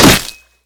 sword hit hi armor hi dmg; Mount&Blade Warband

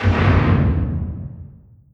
distance light cruiser attack; Hearts of Iron IV Death or Dishonor

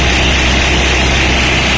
spin loop; Garry's Mod; hl2

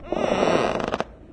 creak, strider; Garry's Mod; hl2

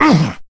man hit; Mount&Blade Warband

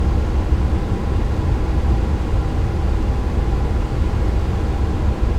amb industrial; ambient; Counter-Strike Global Offensive

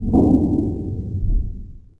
spaceship surrender; Sid Meier's Civilization 4 Complete; Beyond the Sword; Final Frontier; Units

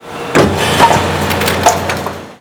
production artillery; Hearts of Iron IV Death or Dishonor

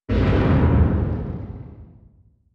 distance airplane bomb; Hearts of Iron IV Death or Dishonor

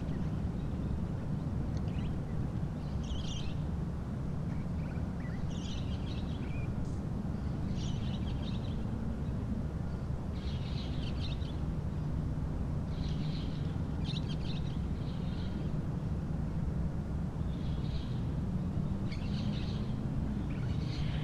city noise birds; ambience; AssaultCube

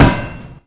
Metal Hit, collision; AssaultCube; Alien Stars; American Muscle Series

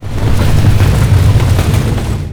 downhill; Left 4 Dead 2

